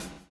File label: BLOW; Spectrasonics - Backbeat